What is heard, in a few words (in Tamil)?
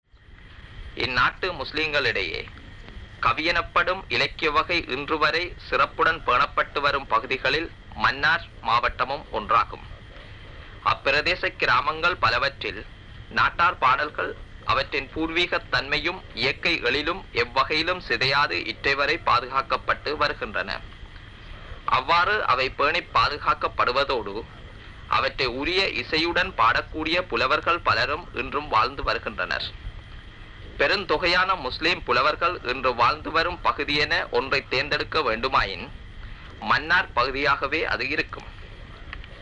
ஆராரோ ஆரிவரோ - மன்னார் பிரதேசம்